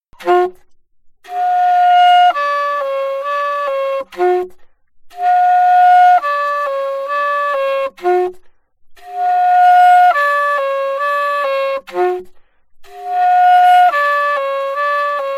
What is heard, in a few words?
altosax